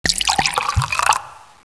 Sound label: cup; CYPHER